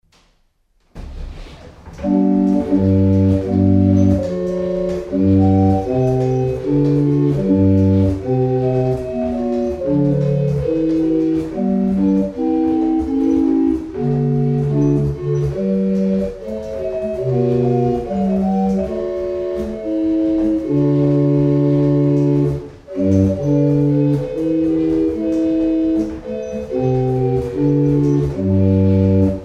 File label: barrel organs; Boxford; Martins Lane